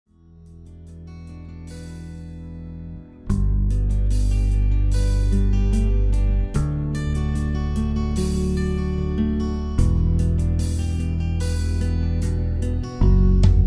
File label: Sampler No Vocals